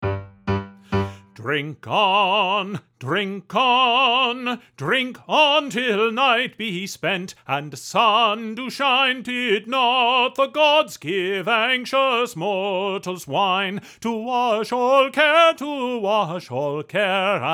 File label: one voice; Drink On